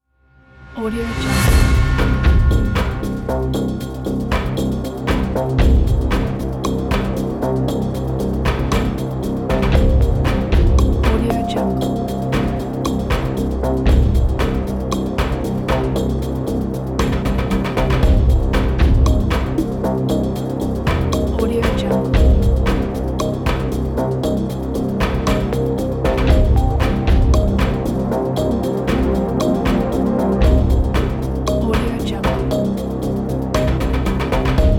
quiz game thinking; millionaire; zigro

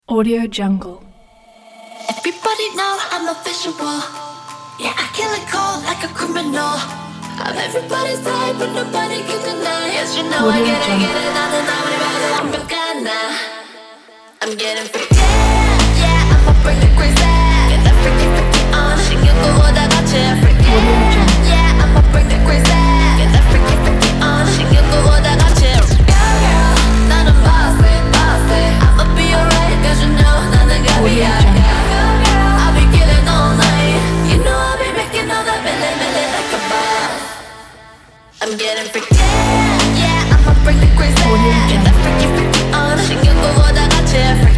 fashion sport trap with vocal

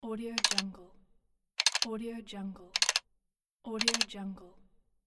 camera shutter

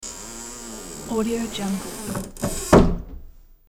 door close creak wooden; hollywoodedge

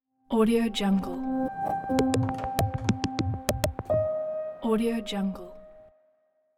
clean piano logo

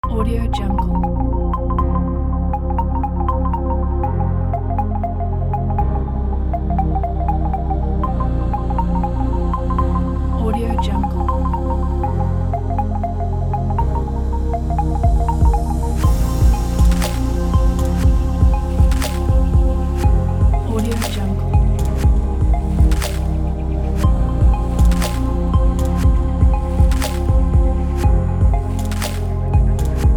abstract technology

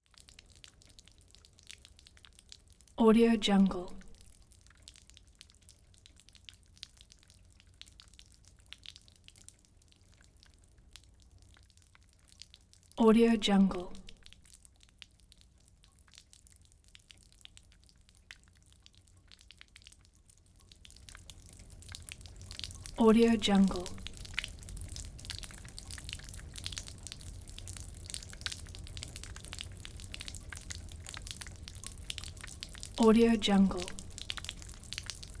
water downspout drippy splatter on concrete

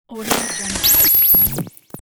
the glitch

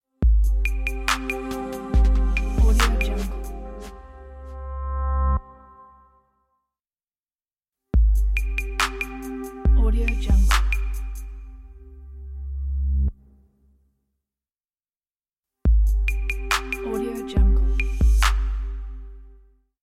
modern minimal podcast logo

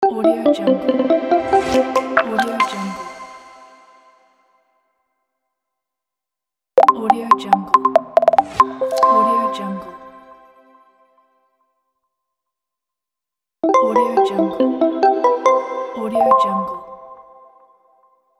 simple logo

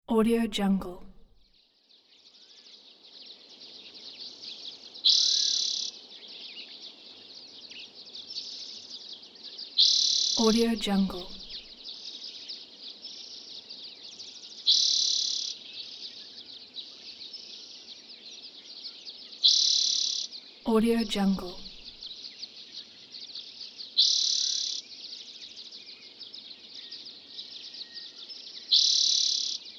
mountains birds

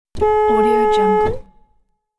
car horn from the inside

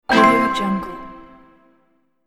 the correct answer

